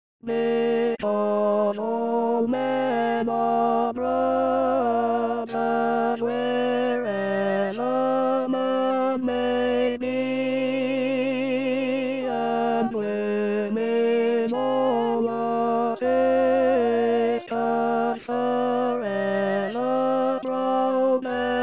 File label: Tenor, Chorale